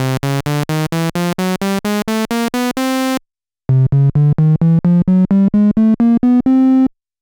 Saw triangle comparison moog synthesizer

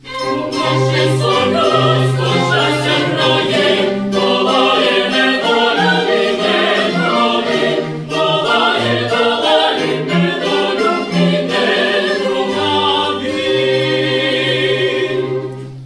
air & choir; Dido & Aeneas

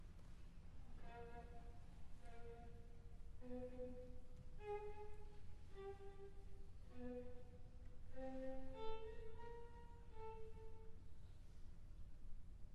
quartet interpretation of eighth note passage; Brentano; Grosse Fuge

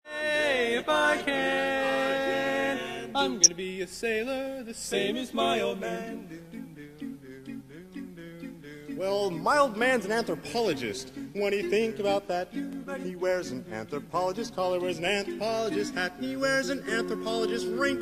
singing sample; Cleanshave; USNA

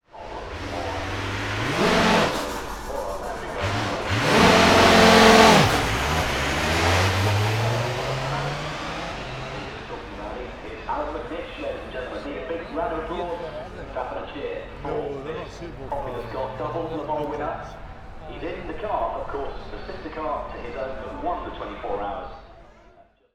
Audi R15 TDI plus

